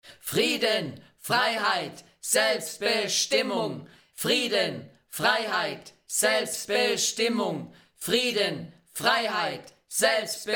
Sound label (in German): Frieden Freiheit Selbstbestimmung Skandierung